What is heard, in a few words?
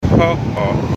haha